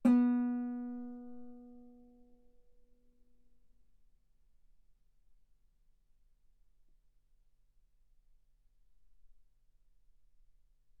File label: Guitar ff sul B B3